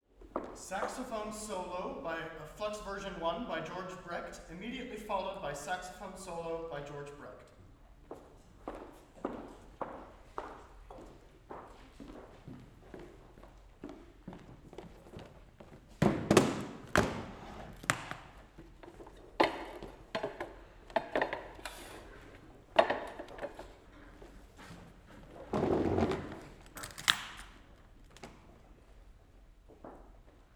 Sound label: saxophone